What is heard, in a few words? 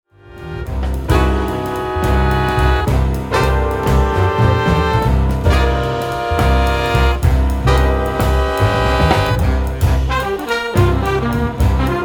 Jazz Ensemble; Blaupunkt